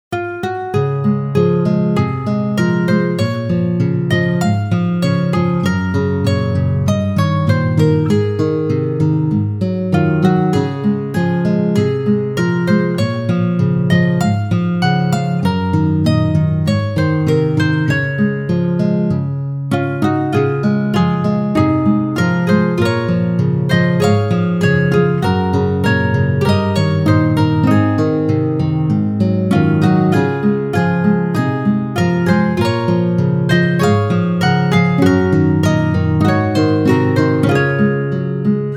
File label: guitar; アコースティックギター音色でクラシカルな雰囲気を表現。